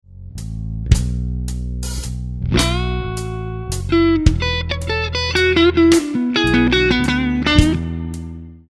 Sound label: Lick, guitar; Slow Minor Lick 7; Minor Blues licks